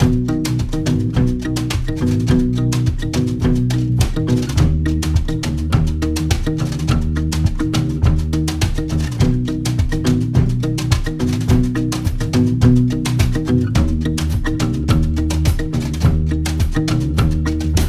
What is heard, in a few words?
104 Bpm